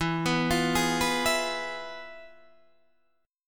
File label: F Minor Major 7th Flat 5th, E